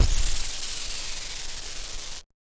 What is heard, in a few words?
Casio, Glitched, malfunctioning synth